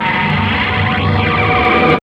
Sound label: SYNTH; E-Lab; X Static Goldmine 1